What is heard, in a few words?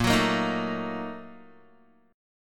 Bb Major 7th Suspended 4th Sharp 5th, Guitar; 6,9,8,8,9,x; 4E,4A,5D,5G,5C,6F; strummed